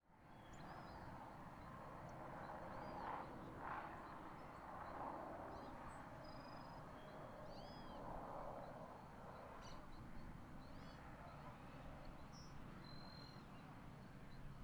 Plantacao de milho perto de estrada vozerio tarde; Coleção Sonora do Cerrado

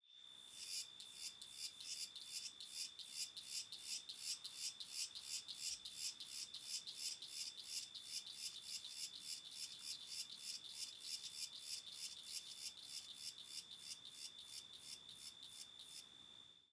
Cigarra Aguda e Fraca em Ambiencia de Mata Fechada; insetos

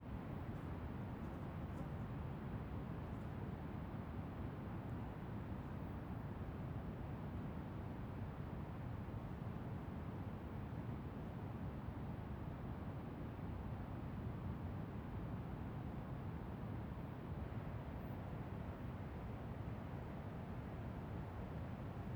Ambiencia em cerrado aberto com cachoeira ao fundo e proxima

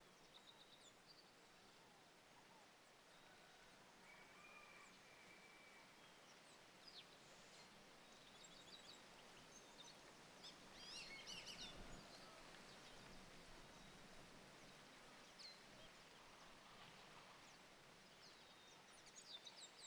Marreca caboclas cantando na mata de manha; aves

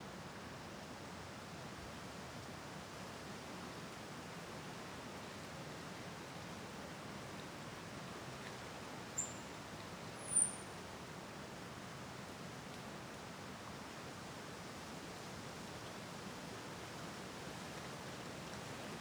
Som de rio longe Passaro muito agudo cantando; aves; Coleção Sonora do Cerrado

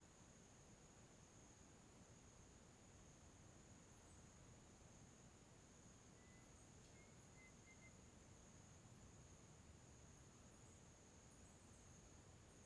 Ambiencia quintal de cidade pequena de noite com morcego, assovio e carro; bem longe; ambiencia domestica

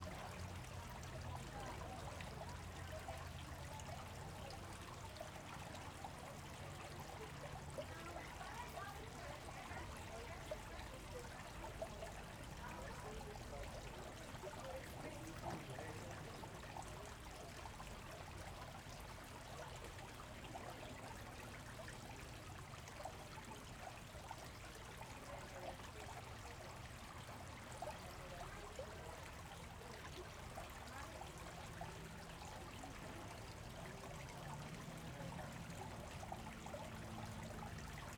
Fonte de agua em viveiro com pessoas trabalhando e transito bem longe; Cerrado

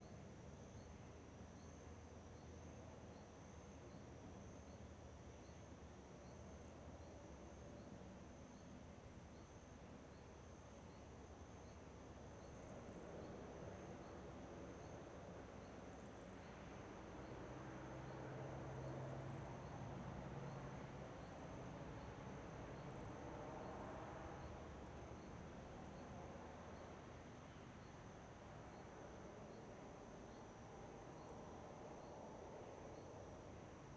Ambiencia quintal de cidade pequena a noite com aviao passando, morcego e; ambiencia domestica

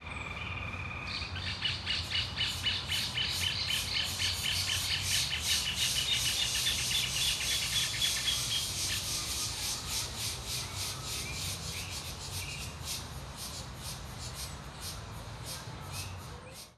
Joao de Barro e Varias Cigarras em Quadra Residencial da Asa Norte; aves

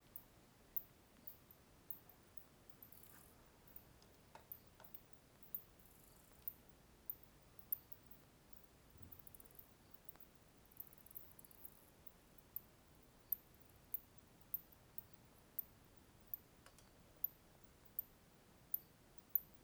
Morcegos a noite com grilo e cachorro; animais; Coleção Sonora do Cerrado

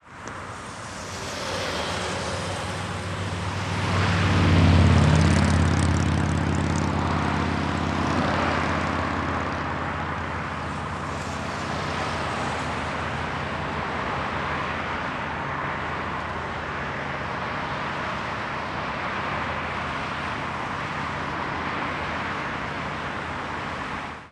Moto Grande Tipo Harley Davison Passando Rapido no Eixo Monumental; surround 5.1

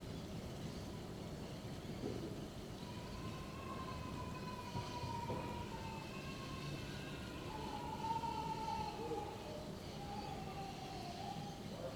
Ambiencia em frente a igreja fim de tarde crianca pessoas moto passando; sinos tocam

